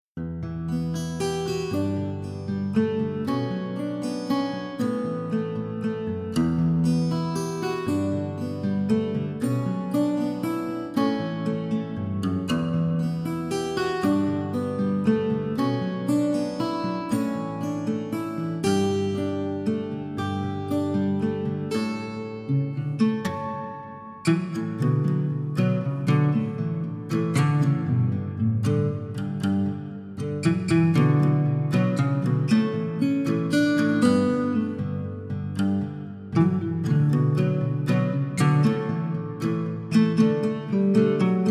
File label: Relaxing Guitar Music Acoustic Calming Music; Stress Relief, Studying; Foundry; FoundryVTT; sr5 Dawn Of The Artefact; Ambiance; Guitare acoustique